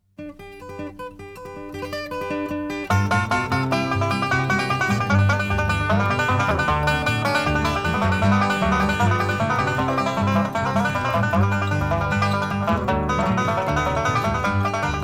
Banjos, Bugle Call; American Appalachian Folk Music

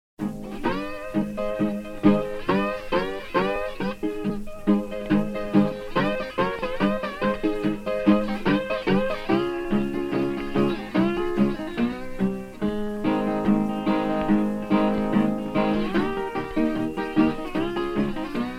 Slide Guitar, blues; Jazz & Rhythm & Blues

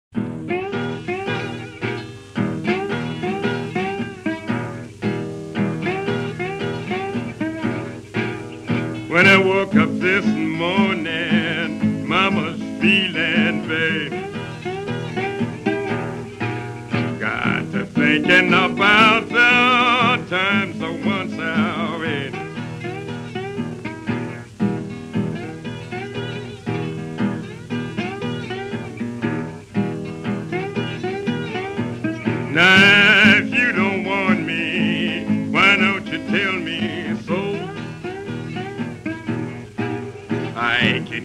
Slide Guitar; Jazz & Rhythm & Blues

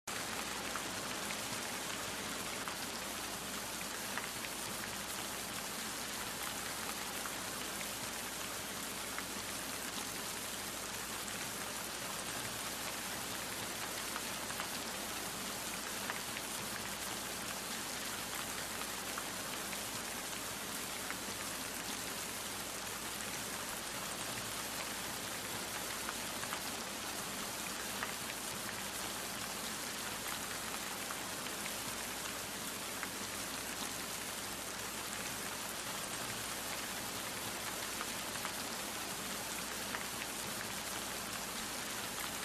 Nature Sounds - Long Rain; New Age

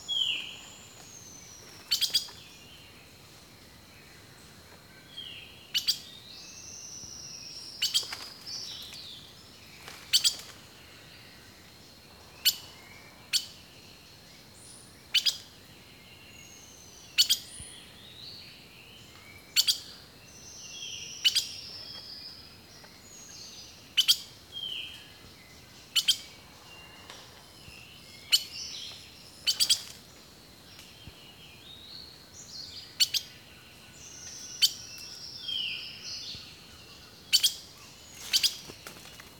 Birdsong, Rainforest; The Natural Sounds of the Wilderness 10 CDs collection

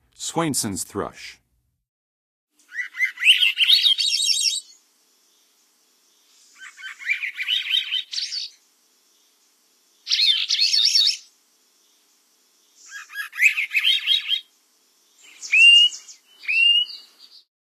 Swainsons Thrush; N. America; Songs of Birds of North America; Western USA Songbirds

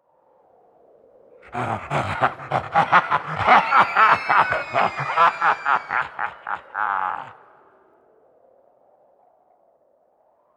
Evil Laugh; Scary Sound Effects

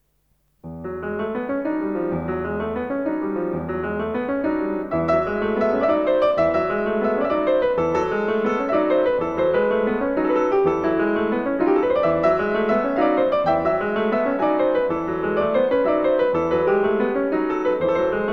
Mikrokosmos Dance in Bulgarian Rhythm I, Piano; Bartók; Sz. 107 Book 6 No.148

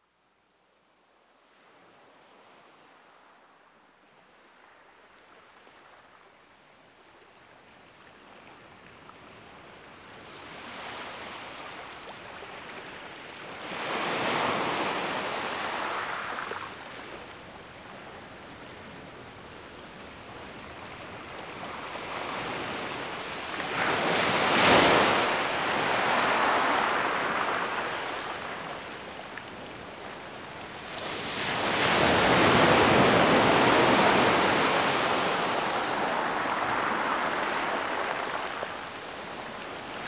Ocean waves

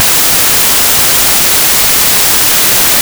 Piano Concerto in C Major, 2nd Movement; Wolfgang Amadeus Mozart; Piano Concerto No. 21; KV 467

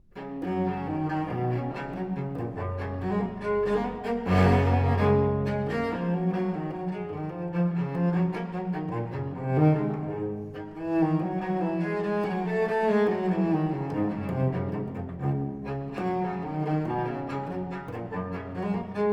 Cello Suite no 1 in G, BWV1007 VI. Gigue; Bach; The Six Cello Suites; Viola de Hoog

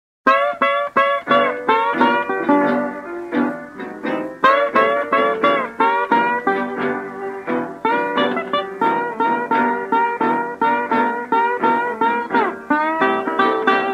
Slide Guitar; Jazz & Rhythm & Blues

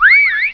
WHISTLE

